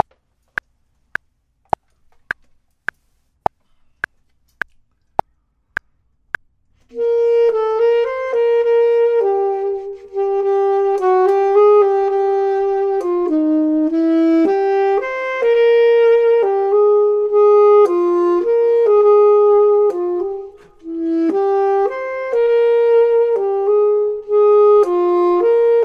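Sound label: top, Saxophone and Clarinet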